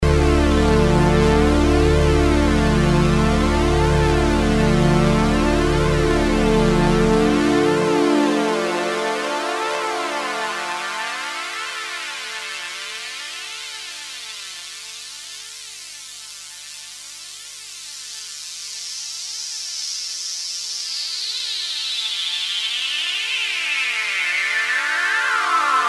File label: Wasp HP